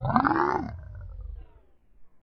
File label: Monster Growl